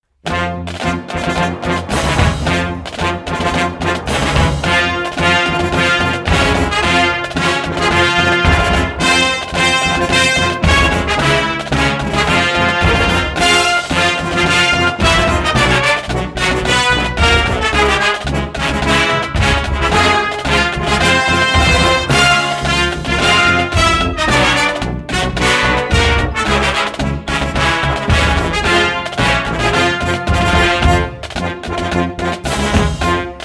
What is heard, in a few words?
Marching Band; Star Wars